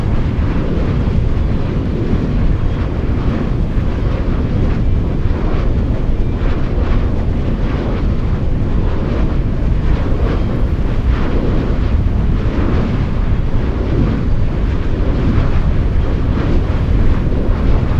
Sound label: Wind Turbine recording